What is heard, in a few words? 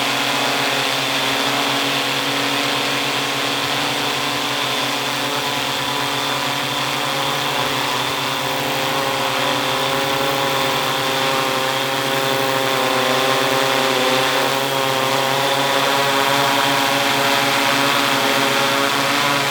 Drone Hover